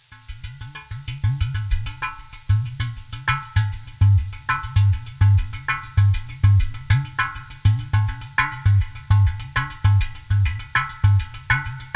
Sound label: udu suite; mono